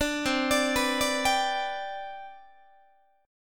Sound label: C Major 7th Suspended 2nd C down Guitar, chord; CM7sus2 arpeggiated; JGuitar